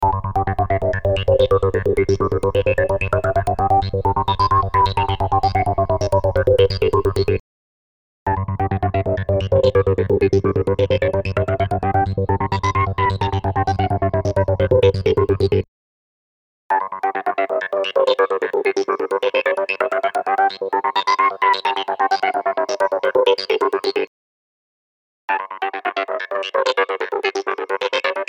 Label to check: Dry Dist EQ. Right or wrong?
Right